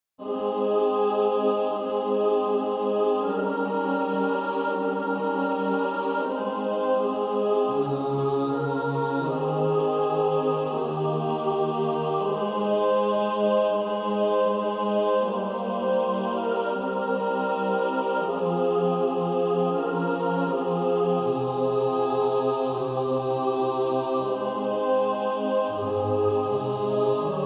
mixed; Praise ye the triune God

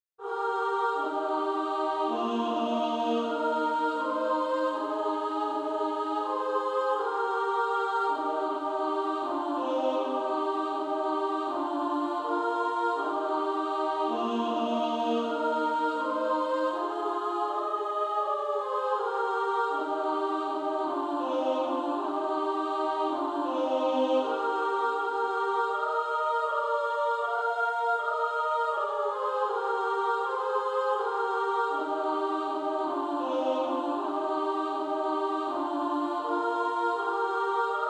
Female Choir SA; He Leadeth Me

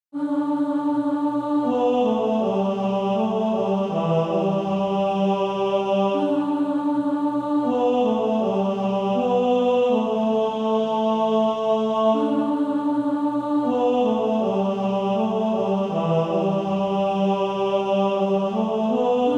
Male Choir

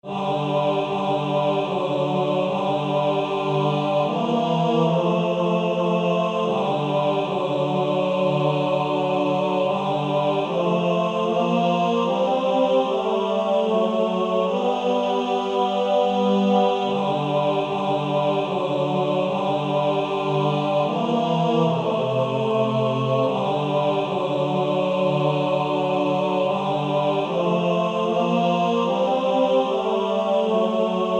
Male Choir; Coventry Carol

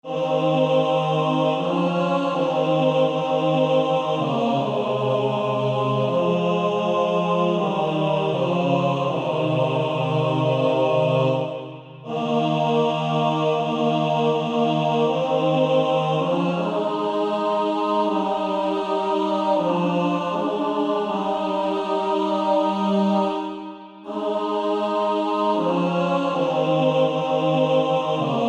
Male Choir

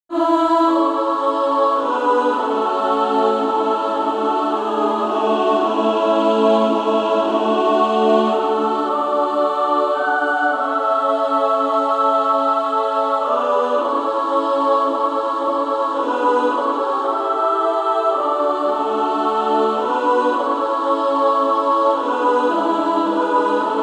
Female Choir SSAA; Christ Liveth in Me

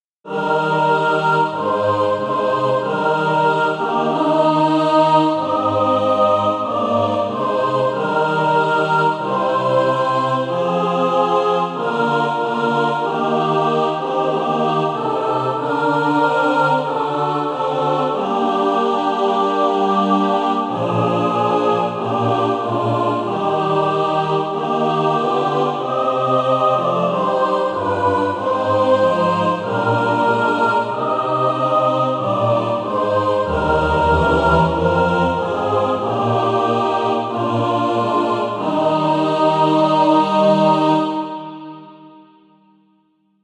mixed

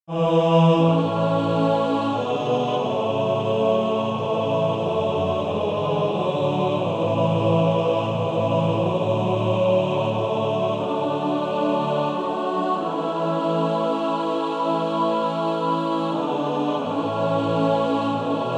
Male Choir TTBB; Christ Liveth in Me

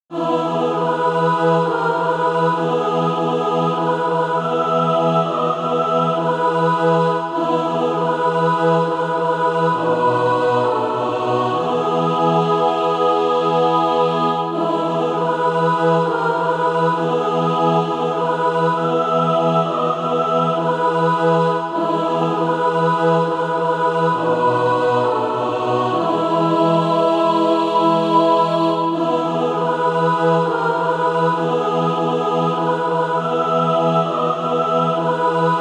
mixed

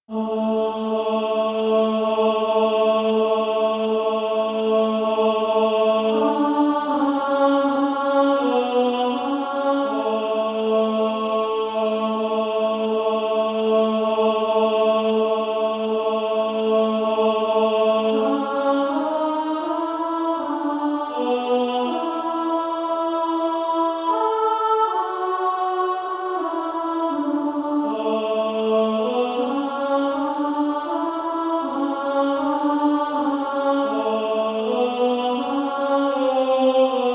Female Choir; 2nd Alto

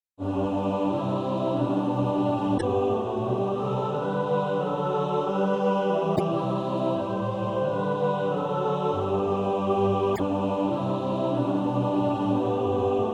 mixed

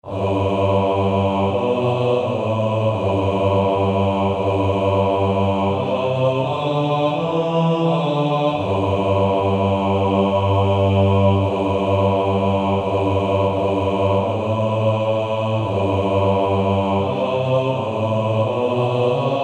Male Choir; Bass

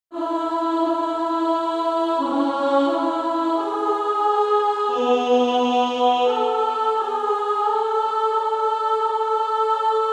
Female Choir 2A; O Come all Ye Faithful

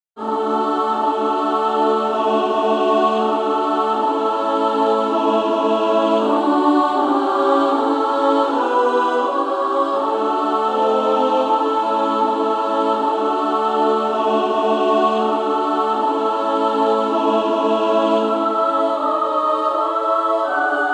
Female Choir

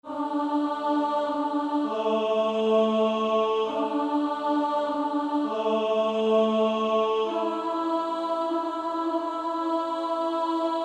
Female Choir A1; SSAA; 1st Alto; Silent Night

